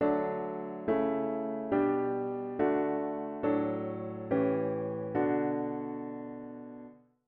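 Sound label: diatonic inverted V7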